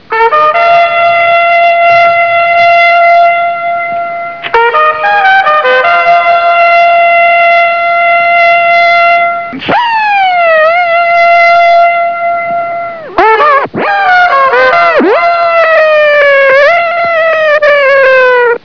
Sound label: fanfare; Fanfare, Mark's Normal One & Lard's Funny Speed One; Mark Radcliffe and Lard